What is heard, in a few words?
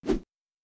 throw wrong answer